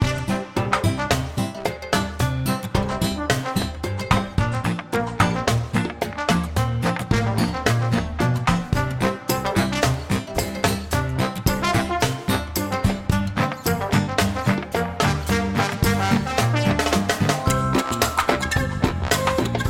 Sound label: Game Music; Cut the Rope Arcade